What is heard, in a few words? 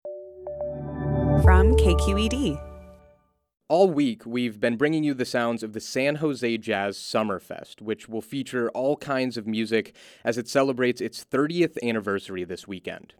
Oakland Gospel Choir